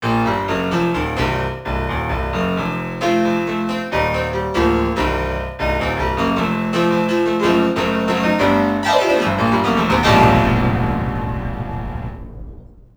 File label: Piano; Loons The Fight For Fame; XBOX